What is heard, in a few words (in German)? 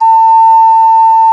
OKARINA A1; FLOETE; Best Service; Alpine Volksmusik